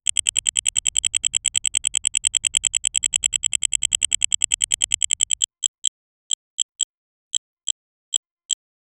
buttons

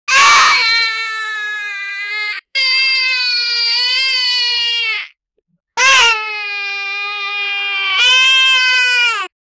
different child crying in, lofi

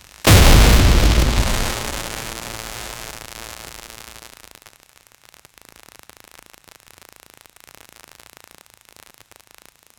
a powerful high voltage e, explosive burst

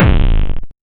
pc game ball hit force, arcade

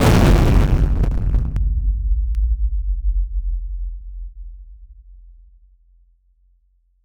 atomic pulse explosion

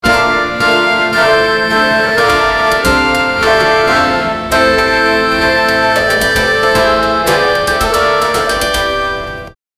war music for soldiers ma, music box

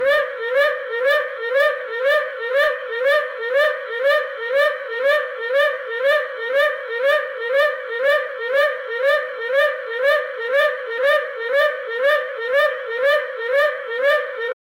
screams alarm noise

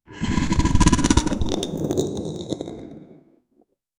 a knight fighting with a

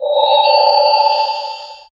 blood priest dying dark

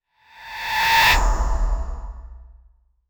swoosh for transition

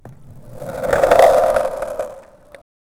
skate park sound

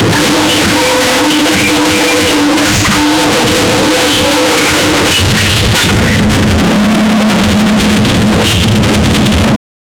goblins gamer rage

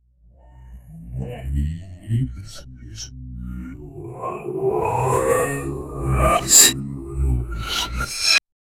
the reversed voice of a demon

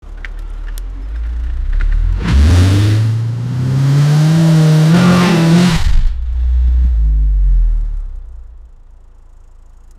sports car acceleration

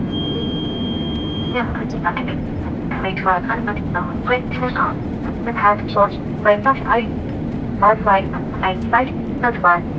airport, pa

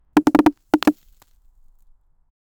a log fell on the

